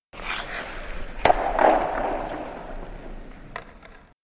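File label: Hockey Ice Scrape Puck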